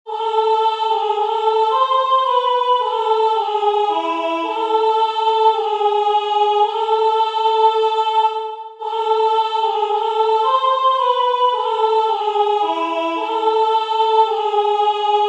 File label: soprano; fum fum fum